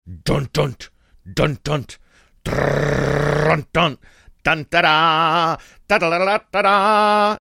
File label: singing fanfare